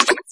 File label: dissolve; Zoomatch